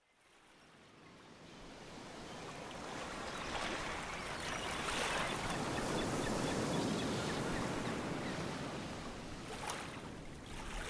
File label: Rainfall Along the Ocean Shore; Relaxing Rain